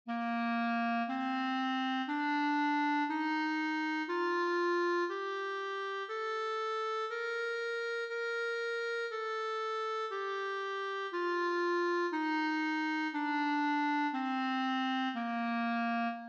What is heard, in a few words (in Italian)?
Clarinetto basso